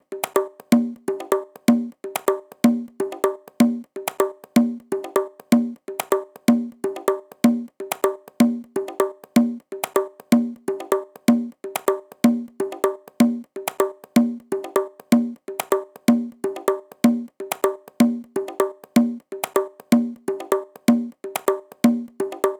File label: 125 Bongos